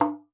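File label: G3, bass flute; Xsample VOL-2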